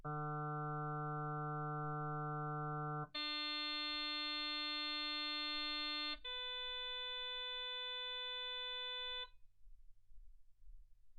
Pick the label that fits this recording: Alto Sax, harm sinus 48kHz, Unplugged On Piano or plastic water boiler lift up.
harm sinus 48kHz